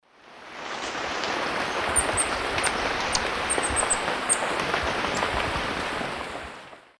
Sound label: Thalurania furcata, flight calls; S. America; Eastern Lowlands